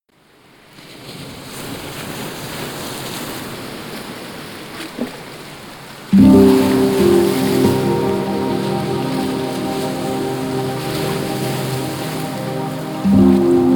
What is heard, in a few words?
Chillout Dreams warm ocean breeze